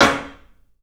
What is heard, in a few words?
CLUB SNARE; 3D Sound; 3D Drums